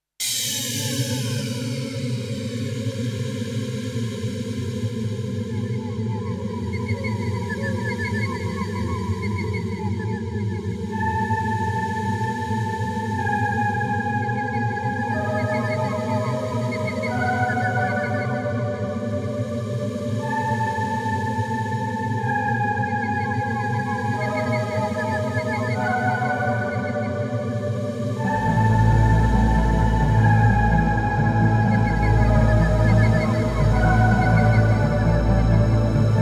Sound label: Music; Theron's Quest; PC Engine